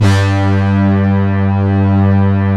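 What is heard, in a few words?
synth, Prophet Five, Analog; E-MU Formula 4000 Series Vol. 3 – Analog Odyssey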